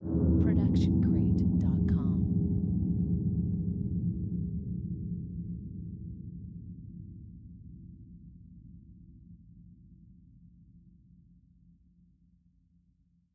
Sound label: Creepy Low Grumble; SoundsCrate